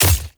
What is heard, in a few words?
Short Sword Medium Impact Flesh; Weapon Impacts; Player; Swords; Combat